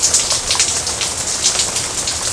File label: RAIN; AKAI S-Series CD-ROM Sound Library VOL-6; WHEATHER